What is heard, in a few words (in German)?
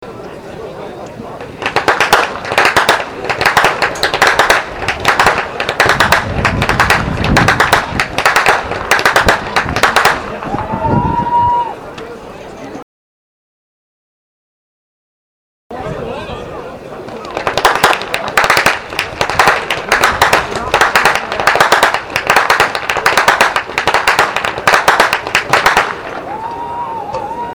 schnalzen, jugend